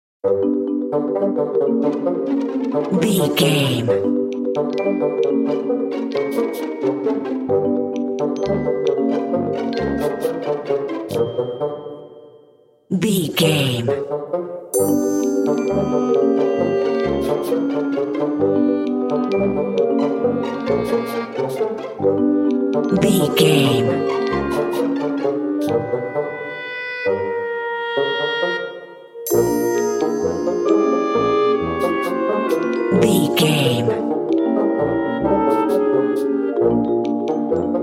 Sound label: suspicious sneaking